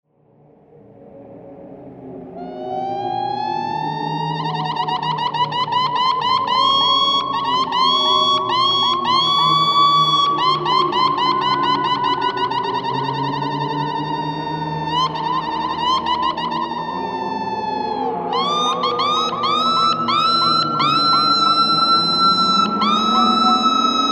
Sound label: echoes seagulls; POMPEII T1